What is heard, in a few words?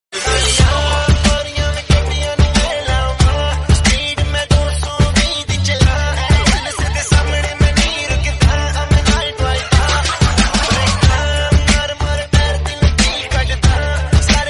panjabi ringtone